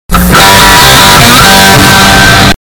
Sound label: bass boosted